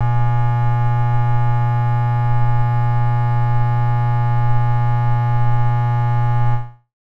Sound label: transformer, Transformer Hum; E-MU Producer Series Vol. 3 – Hollywood Sound Effects